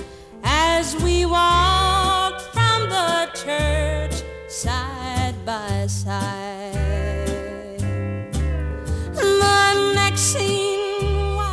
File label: country